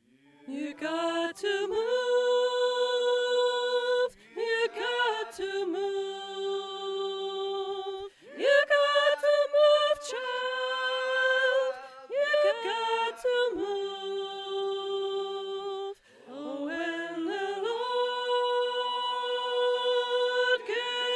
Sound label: You got to move Alto; training recordings